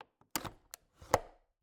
Cabin, window, latch, flip, lock, open, wide; Eiravaein Works; Vaeyan IV

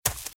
Footsteps Leaves Sprint Left; RadwinIsland

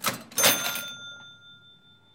cash; Quentin C's Gameroom

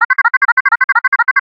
Arcade Movement, Video Game Sounds; 1001 Sound Effects

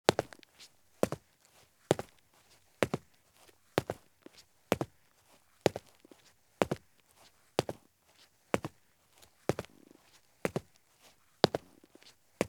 Soft Hiking Boots Walk at Medium Pace on Concrete; Sound Ideas; Footstep Sound Effects

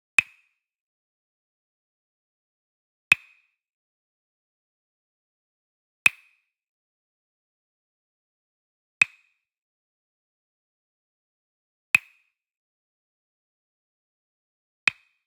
Wood Percussion Wood Block Japanese Hit Near; boom library; Cinematic Strikes Construction Kit

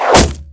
Bow And Arrow, Whoosh With Impact; gun and weapon sounds; captain audio; fully loaded

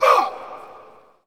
Hockey Male Hurt; SFX Kit; Hockey Vocal Sounds

